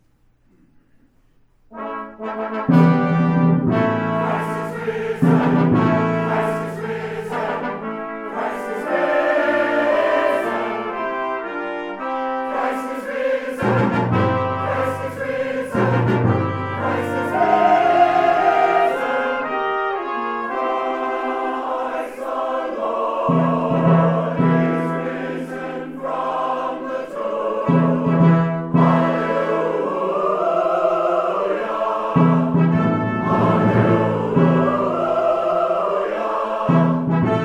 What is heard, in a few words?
Easter Fanfare